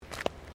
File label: FOOTSTEPS THONGS (FLIPFLOPS) CONCRETE STEP; Footstep Foley; Footsteps (Sound Effects:Human)